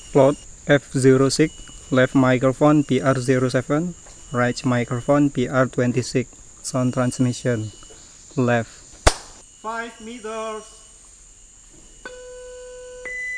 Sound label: birds; ecoSound-web